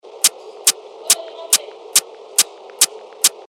140 BPM, techno loops; Innovate; revealed techno top loops vol 8

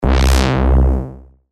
Bass Shots C; Cheat; revealed bass shots vol 3

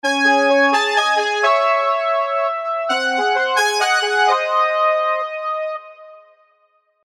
Brass Hand; alonso happy holidays 2021 spire soundset

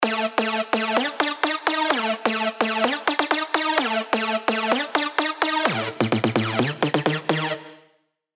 Sylenth1 dirty dutch leads; Getting Loud; revealed sylenth1 dirty dutch leads vol 1

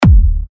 50 D, techno kicks; Cronopio; Revealed Techno Kicks Vol. 10

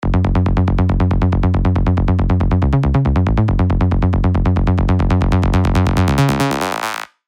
rolling bass; Groove Merchant; Revealed Serum 2 Rolling Bass Vol. 2

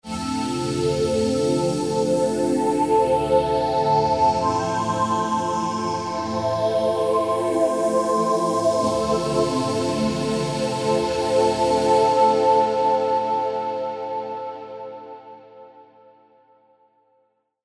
pads; Modeling Engine; revealed spire pronoia pads

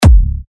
C#, techno kicks; Homeland; revealed techno kicks vol 10

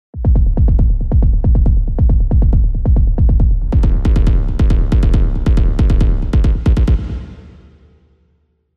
Sub Gallop, techno bass; Spire; revealed spire techno bass vol 1

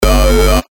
Bass Shots G; Static; revealed bass shots vol 3

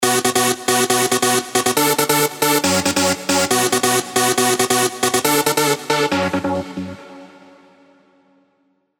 Stab; Stab Sober Raving; Revealed Serum 2 Big Room Techno Drops Vol. 4

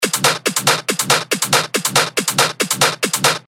140 BPM, techno loops; Dracula; revealed techno top loops vol 8

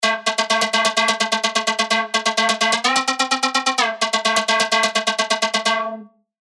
Bent Bongo, dirty dutch leads; Spire; revealed spire dirty dutch leads vol 1

